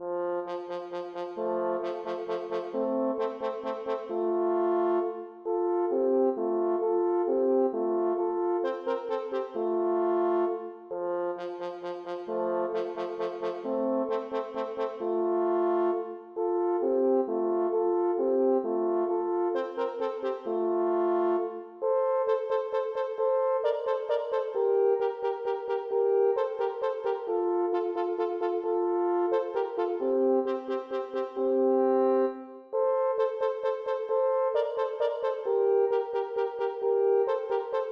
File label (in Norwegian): For to horn